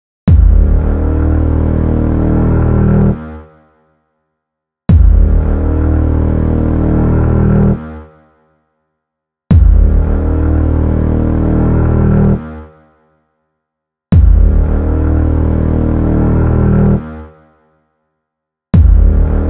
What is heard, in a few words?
new message